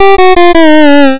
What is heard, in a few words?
fail 8bit